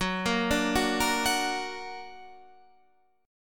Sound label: Gb Augmented Guitar Standard; 14,13,12,11,11,14; Gb+ arpeggiated